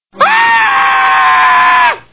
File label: aaahhh